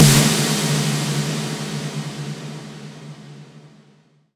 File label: Impacts, Effects; Vengeance; Essential Clubsounds Vol.4